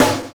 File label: Snare, drums; Sonic Foundry (Sony Creative Software); Drums & Drones; Construction Kits; Track Kit